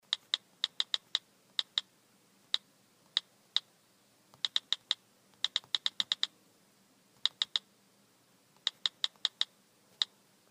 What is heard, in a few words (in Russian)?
zvuk klaviatury aifona